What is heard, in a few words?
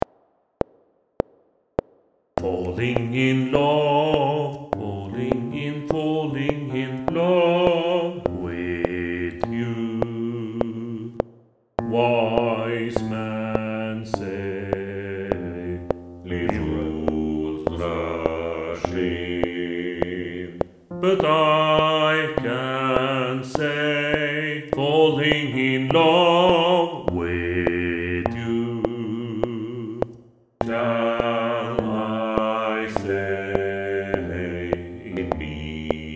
Bass 2